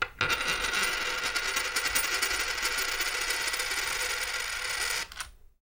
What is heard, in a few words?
spinning coin